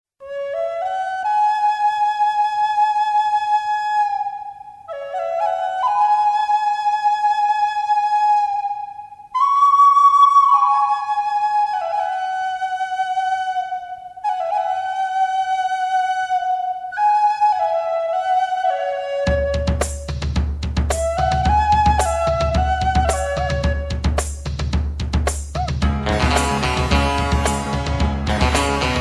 flute

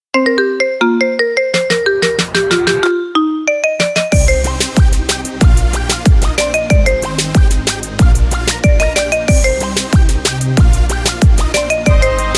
marimba remix; ringtone

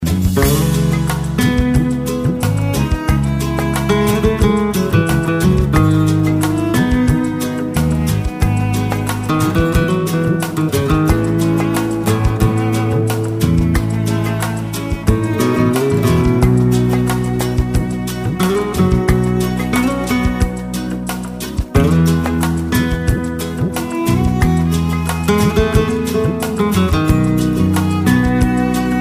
light hispanic guitar

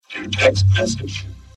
robot sms

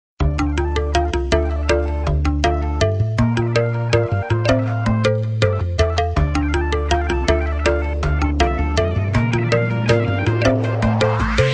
arabic hip hop

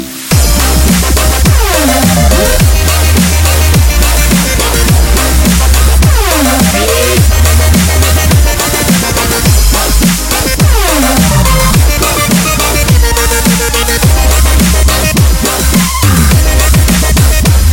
dubstep

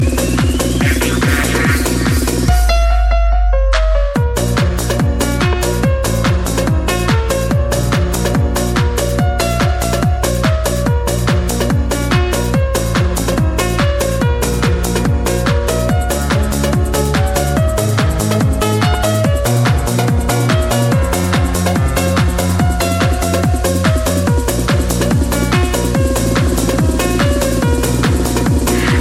fur elise techno mix

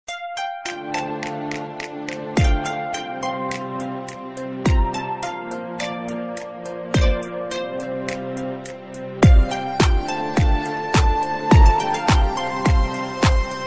guitar; titanic

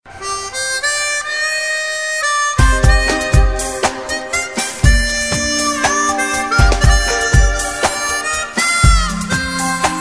arabic tone

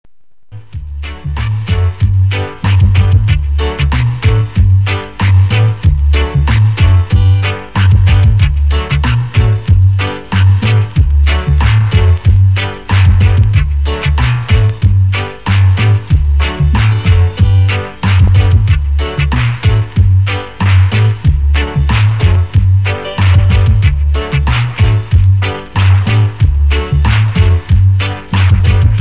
reggae instrumental

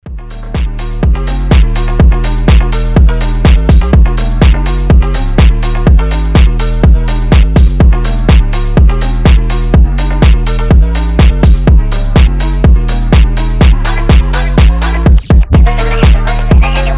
blue phonk house

